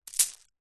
small stones handful drop onto others; foley; zapsplat

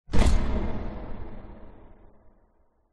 cinematic hit thud bright airy; sound design

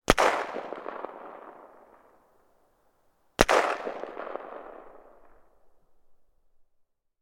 gun ak 47; still north media

